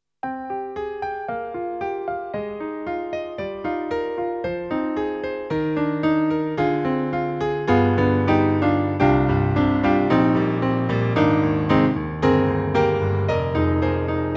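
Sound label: General MIDI Piano; Roland; XV-5050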